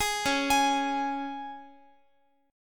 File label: Db 5th Db down Guitar; Db5 arpeggiated; JGuitar